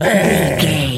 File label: gorilla angry